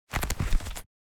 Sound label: Leather wallet handling; foley